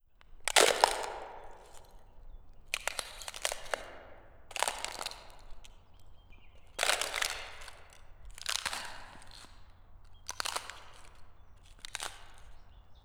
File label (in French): monstre mange croquant; Feed The Beast; Monster